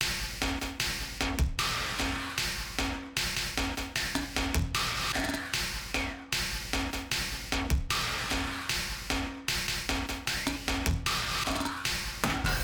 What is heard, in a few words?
Perc L 152bpm